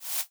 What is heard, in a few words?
step; Incantation